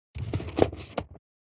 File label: Rolling